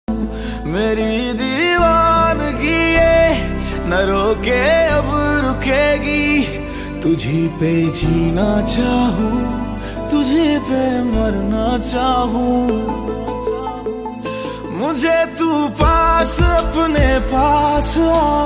romantic song ringtone

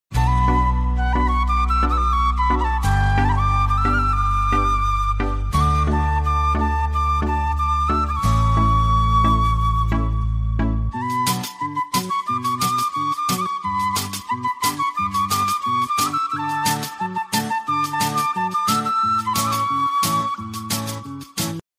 flute ringtone

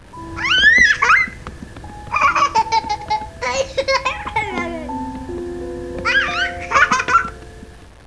baby laugh funny ringtone

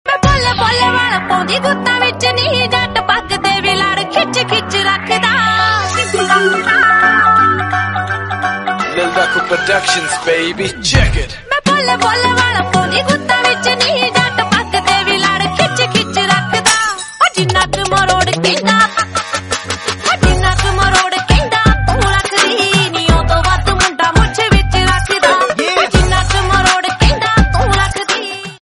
Punjabi Song

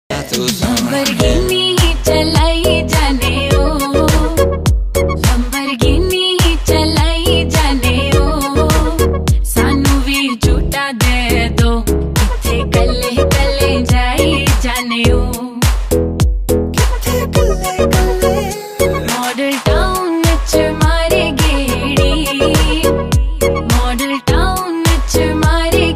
Punjabi

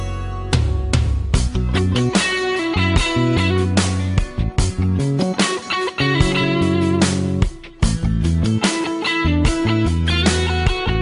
guitar

